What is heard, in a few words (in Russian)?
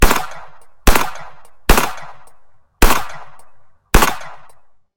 tir fonovaya strelba; pnevmatika